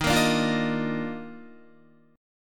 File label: Eb Major 7th Suspended 2nd Eb Guitar 4E 4A 5D 5G 5C 6F; EbM7sus2; strummed; JGuitar